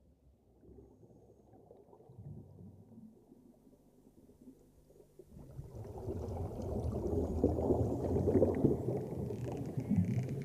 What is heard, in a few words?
Whales Dolphins ~ Natural Sounds; 1 hour